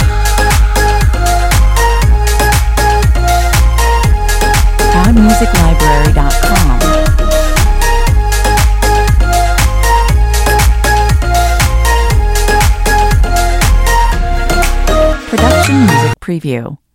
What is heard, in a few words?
tropical summer loop